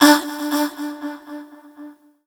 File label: FEMALE, Dance Vocals